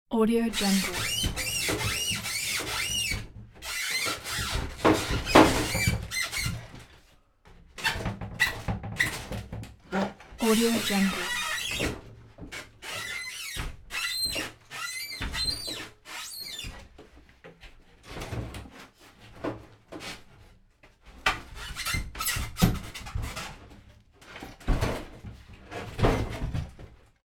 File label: demolishing old squeaky furniture; domestic sounds